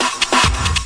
138 bpm, hard snare techno twos, drum loops and breaks